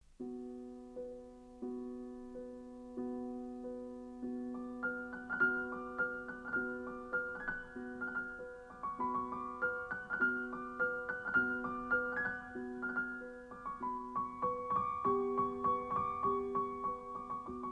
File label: Romanian Folkdances In one spot Andante